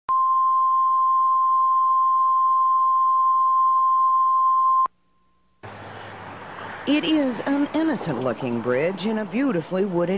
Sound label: news countdown leader